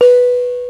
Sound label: Kalimba; Mo' Phatt; Digital Sound Factory Mo' Phatt